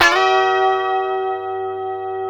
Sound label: F 4 D funk chord, guitars; Spectrasonics; Hans Zimmer Guitars VOL-1; 90's Sample CDs